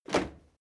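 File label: step crowd march loud single left, footsteps; menschen; schritte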